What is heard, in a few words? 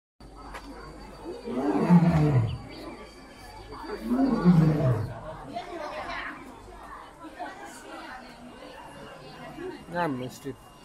Liger